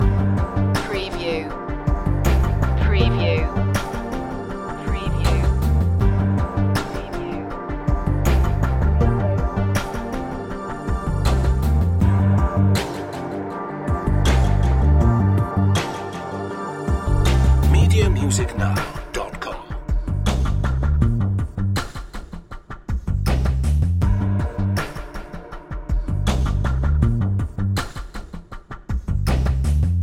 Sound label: quietly confident loop